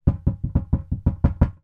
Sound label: storage box plastic knocking on microphone inside; foley